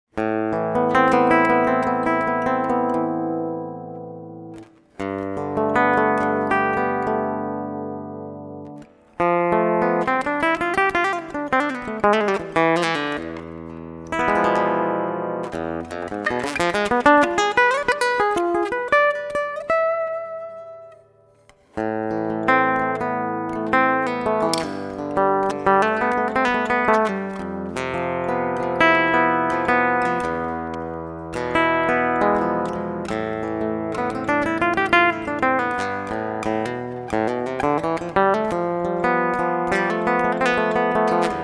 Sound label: Hybrid Nylon Flamenco ish; Harley Benton